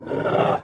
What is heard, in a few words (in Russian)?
udar